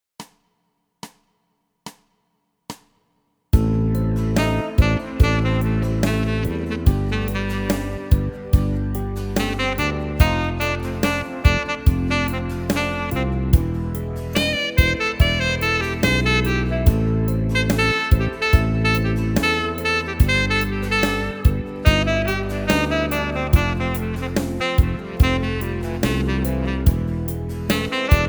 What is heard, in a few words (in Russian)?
Track plyus potom minus